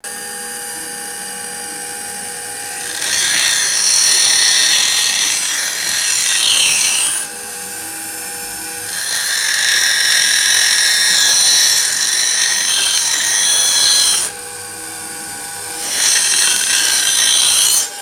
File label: motorized grinder humming and grinding metal; tools